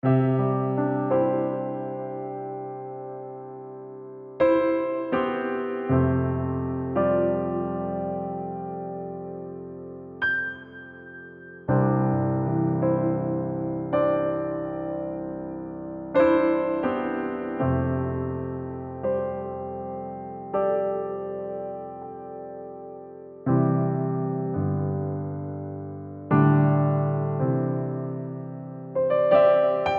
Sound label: We follow you Lord Playback